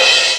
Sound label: sin2 0.40s